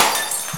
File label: glass shatter short